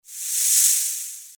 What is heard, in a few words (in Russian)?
zvuk kobry